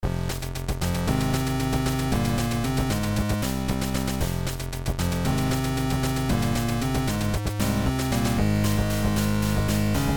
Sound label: 8-bit; AVGN